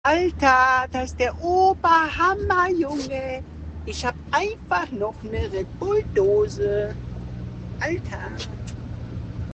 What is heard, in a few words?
ober hammer